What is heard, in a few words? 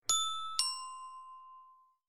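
standard SMS ringtones; zte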